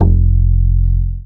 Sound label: Standup F, One Shot Bass